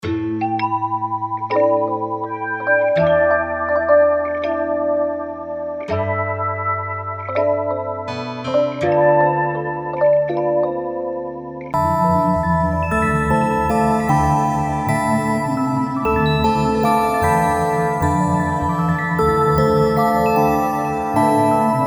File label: Loops; Hit Maker